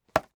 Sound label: box grab department store type